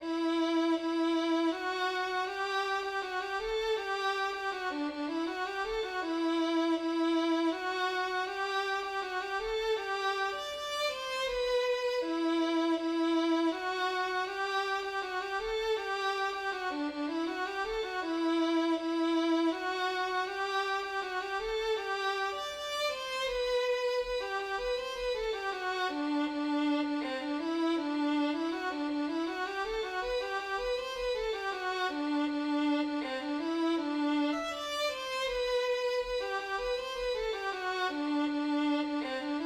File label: flute voix, scottish